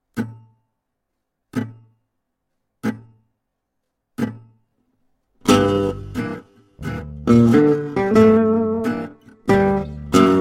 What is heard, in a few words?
Minor Blues 1st Chorus